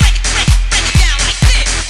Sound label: breakitdown, audioloops